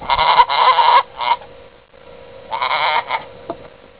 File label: pygmy, goats